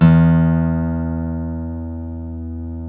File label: STL NV E 2D, FOLK STEEL Guitars; Spectrasonics; Hans Zimmer Guitars VOL-1